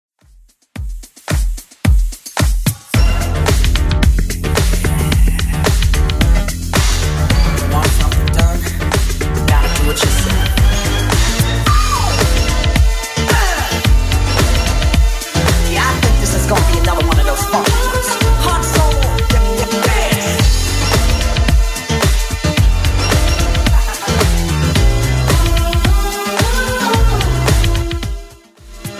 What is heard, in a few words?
80s Club 110bpm